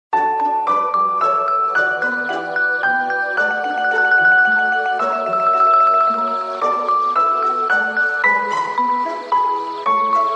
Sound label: Ringtone